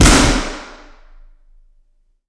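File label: Lunch Room Center; FL Studio 9; Fruity; Edison; Reverb; 29x37x12ft; 10ft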